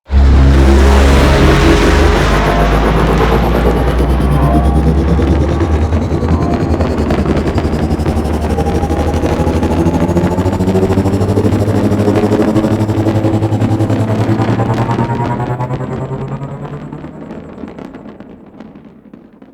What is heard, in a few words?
Dropship Large Hover Depart; Venus